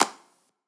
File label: clap one shot; sober; Slate; SD PASADENA Pop Sample Pack